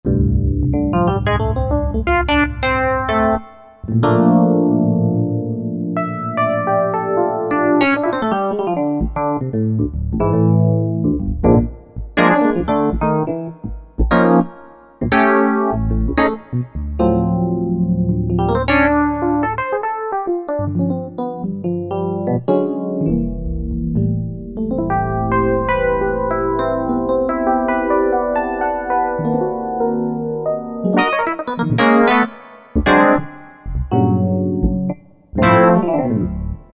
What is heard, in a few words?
EP GS77, Yamaha TG77; Factory Presets